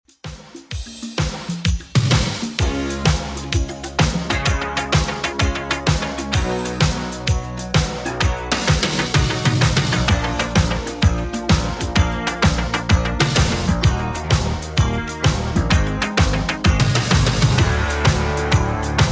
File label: 80s Dance Redrum 128bpm